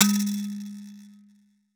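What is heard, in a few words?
RATTLE KALIMBA G#3; Heart of Africa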